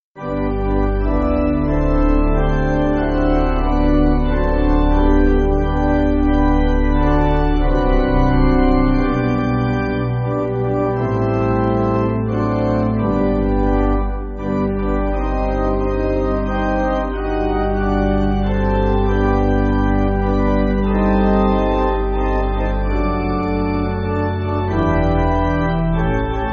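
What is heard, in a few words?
Pipe, Pipe Organ, Music Backing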